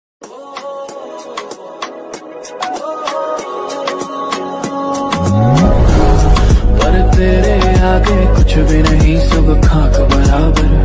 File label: lofi song